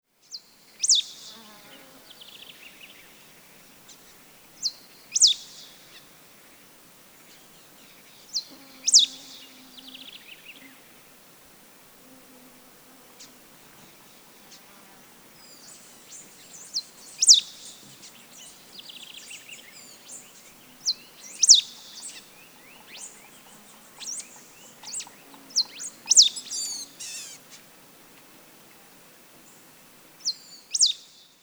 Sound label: Serra finch